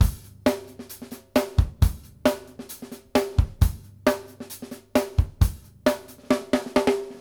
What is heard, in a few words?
basic A, drumworks